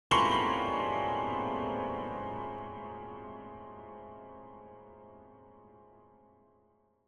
Piano High Tension Chord; Hollywood Edge; sound designer's toolkit v2

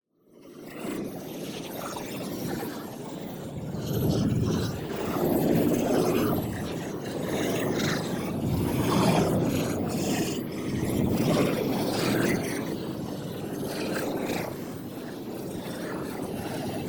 PARTICLES; Digital Enchantment; boom library; Matter

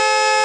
Car Horn Loop; Automobiles

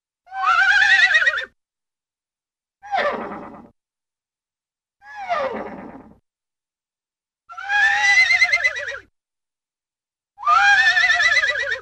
Horse Sounds; Cartoon; Hollywood Edge; Cartoon Trax

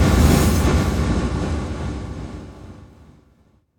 POW; XXL 800 Production Effects

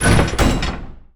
Door Metal Crypt Open; Doors

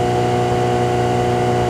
Mazda MX3 Loop, Automobiles

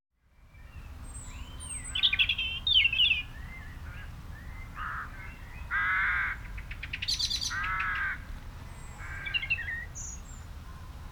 Birds country garden; Birds, Insects & Animals

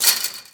Fence Chain Rattle; SFX Kit; Foley - Rattles

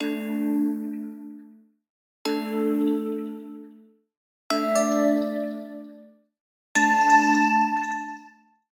Digital Click Positive

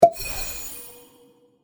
health potion; Monument Studios

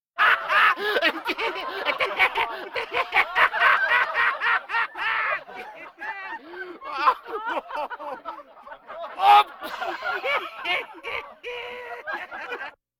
Vocal effects breathing Moaning Baby

